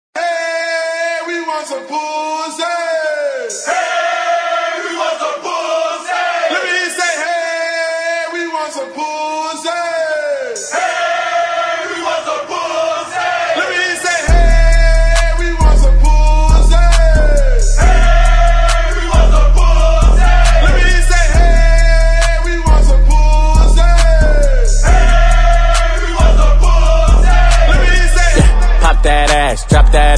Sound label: Acap In